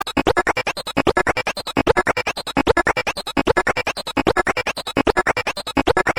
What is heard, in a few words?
robot; synths; pig